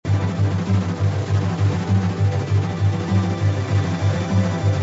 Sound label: win huge loop; Rabcat; Classic 243